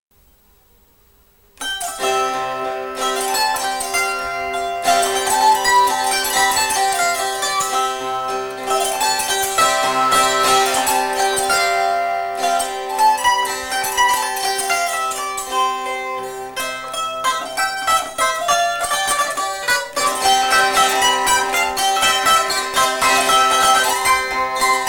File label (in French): epinette